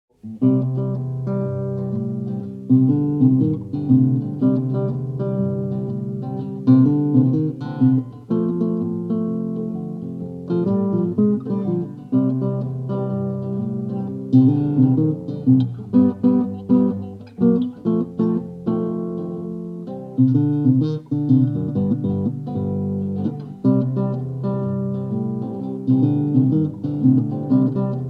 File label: Blues, guitar